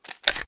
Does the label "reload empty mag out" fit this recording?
Yes